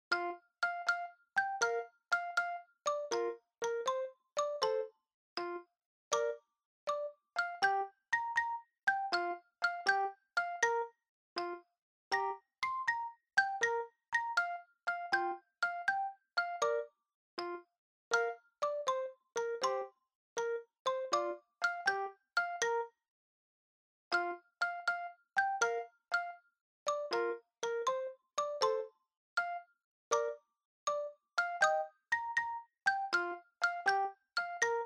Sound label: Shuffle; Yuyake Koyake